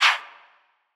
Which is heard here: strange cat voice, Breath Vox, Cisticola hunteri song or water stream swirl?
Breath Vox